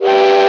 TRAIN HORN

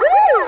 POLICE SIREN FAST; gta1 cars

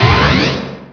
GENERAL POWERUP

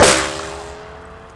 BULLET WALL

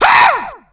PED SCREAM

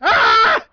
death scream

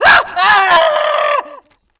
NEW PED DON FIRE; gh sounds

